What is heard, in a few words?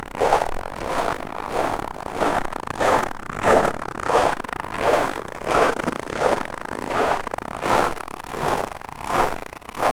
a sled sliding on the